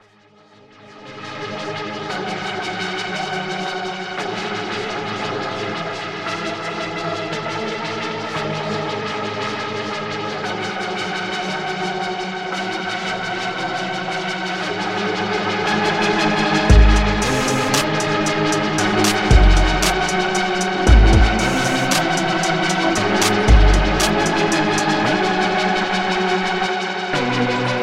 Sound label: Instrumental Version